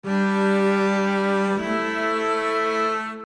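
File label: V-VI tenor bass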